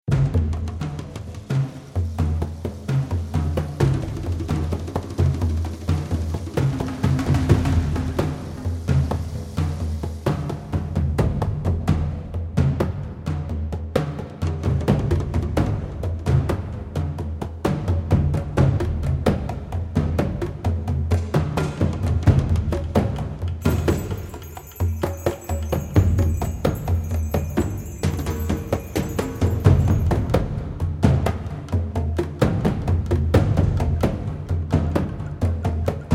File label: tribal music